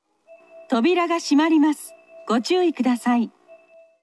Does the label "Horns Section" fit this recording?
No